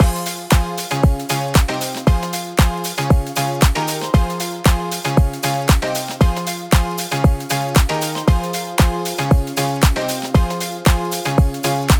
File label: Electronic, Clubbin; Electronic Vol4 Music Pack